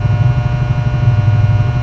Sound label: generator Hum